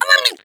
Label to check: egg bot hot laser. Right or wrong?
Right